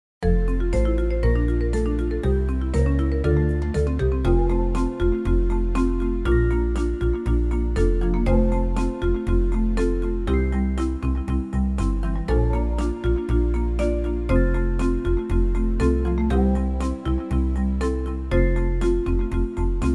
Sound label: country ballad